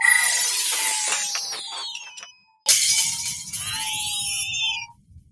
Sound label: lock and emergency heal; sao; paralysis